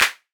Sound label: clap sharp, rage distortion